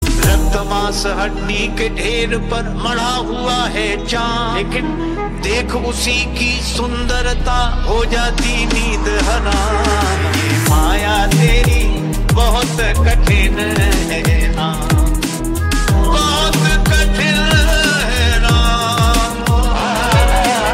Rap Ringtone

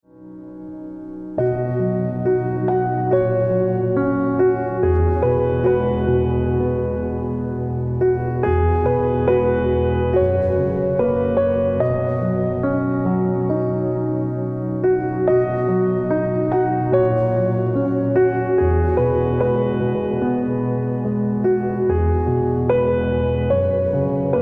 Ringtone

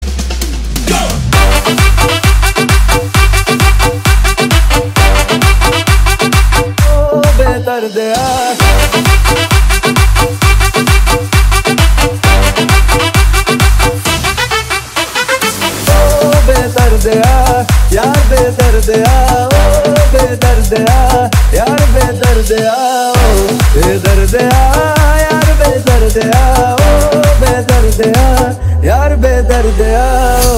Ringtone

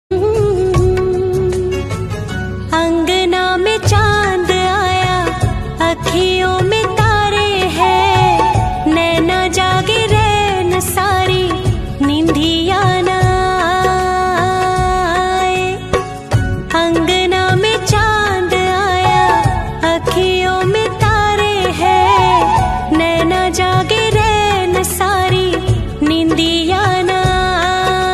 Ringtone

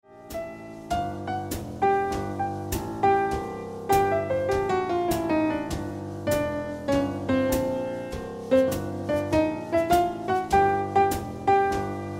Piano Music

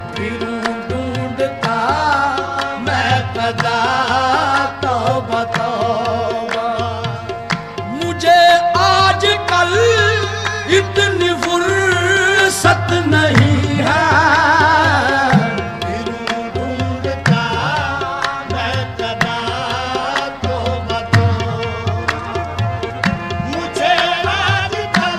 Ringtone

